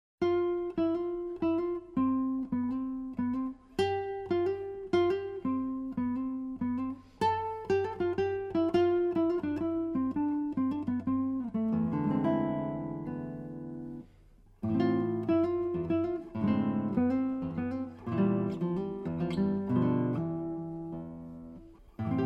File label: For Guitar; Castelnuovo-Tedesco; 24 Caprichos De Goya